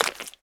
mud step; Golden Crayon Sounds

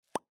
Pop; iOS Sounds

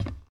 glass step; Golden Crayon Sounds

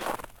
snow step; Golden Crayon Sounds

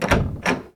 door open; Golden Crayon Sounds

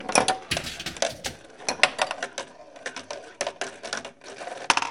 ultrabomb deploy; Original TK Sounds

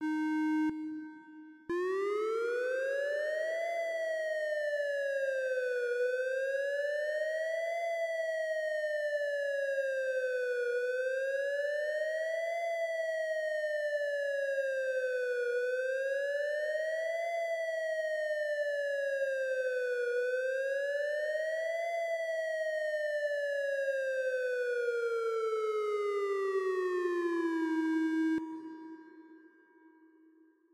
siren; Golden Crayon Sounds

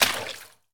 water step; Golden Crayon Sounds

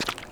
wet concrete step; Golden Crayon Sounds

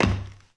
glass land; Golden Crayon Sounds

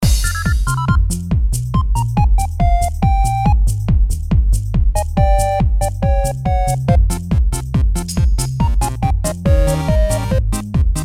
main music; RS Games Sounds

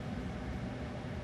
vent; Original TK Sounds

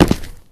concrete fall; Golden Crayon Sounds

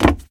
deck land; Golden Crayon Sounds

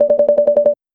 ringing watch; iOS 26 Sounds

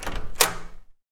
door open; Golden Crayon Sounds

